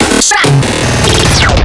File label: FILL, PsyTrance